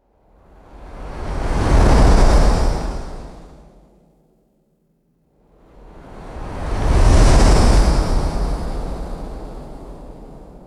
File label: slow airy windy whooshes passing by; swooshes